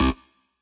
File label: bass